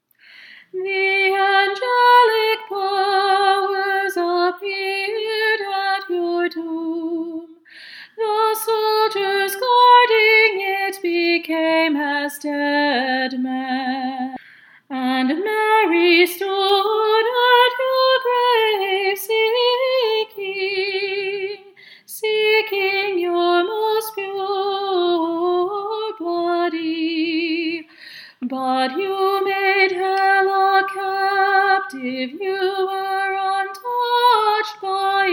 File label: Apolitikion Aggelikai Dinamis Tone 6 English